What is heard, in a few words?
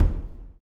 STOMPS; Spectrasonics; Vocal Planet; Blues Soul